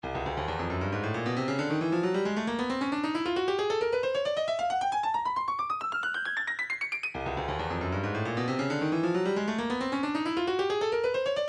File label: 147 tambourine; Lead Foot